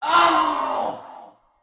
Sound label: scream